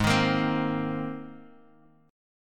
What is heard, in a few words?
G sharp Major Add 9th G sharp, Guitar Open F; G#add9 Chord